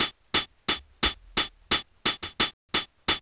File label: HH LOOP; Ragga & Dub Reggae; HH LOOPS; AKAI; USB Soundscan vol.27